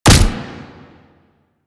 Fulmin Prime Punch, Weapons; Warframe

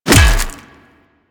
Melee Hammer Impact Flesh; Warframe; Weapons; Hammers

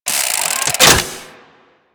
Reload; Grn Ogris

